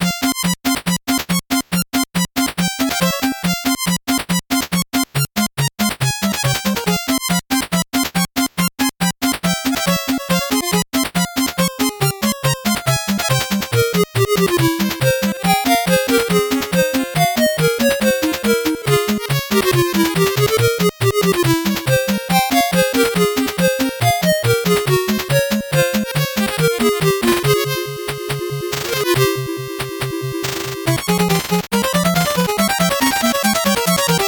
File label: 8bit bgm normal